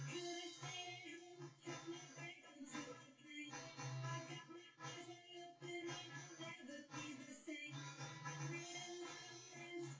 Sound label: test wdrums synthconv; SiSEC10; underdetermined